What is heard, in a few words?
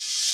Percussion, Hip-Hop